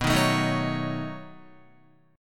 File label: B 9th B down Guitar; strummed